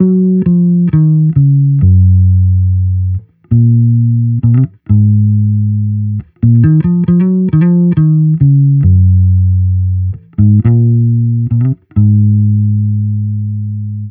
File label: Amped loop 68 C#m, Electric Bass Loops; Slate Digital BASSHOLE Sample Pack